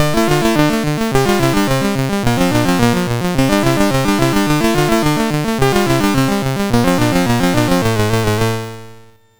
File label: Laserwheel sid MOS6581R4